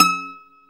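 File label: BZ F E, bouzouki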